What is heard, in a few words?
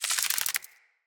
Infested Ashen Move Turn